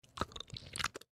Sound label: gecko lick; npc